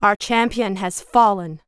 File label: sentinel hero dies; Warcraft III Frozen Throne